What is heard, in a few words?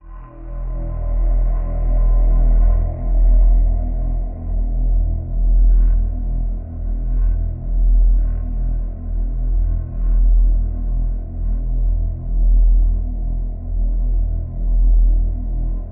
hollow drone; 3maze; Ultra Drones